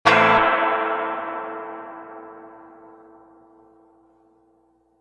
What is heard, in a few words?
reverb tank 3 spring long quick strum